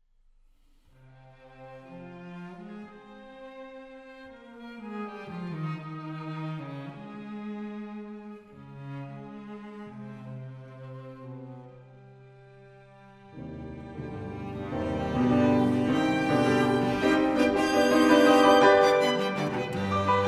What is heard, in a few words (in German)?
Klaviertrio f-moll, Allegro ma non troppo; Dvorak